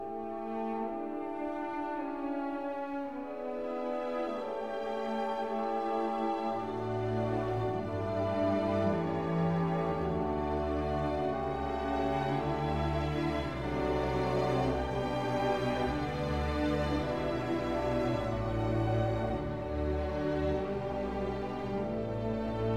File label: Brahms Piano concerto no. 1